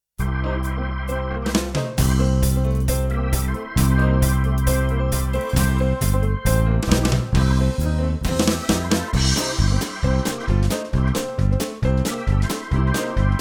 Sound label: fast gospel